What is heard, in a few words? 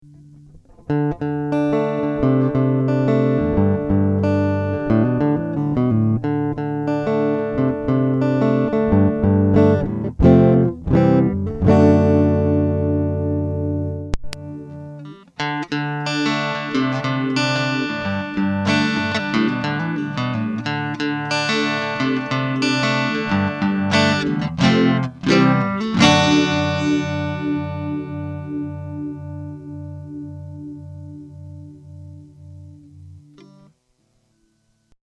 Joyo Vintage Phase; Audios Joyo